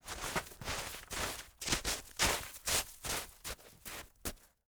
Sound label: Weather Snow Moving; AdobeCC